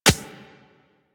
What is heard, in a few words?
Beach Ball Floof Bounce Dirt